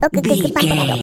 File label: voice funny cartoon misc